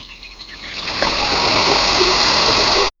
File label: generate three tones that, school bell